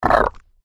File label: habu wound